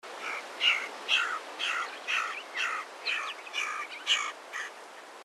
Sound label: Red head pecker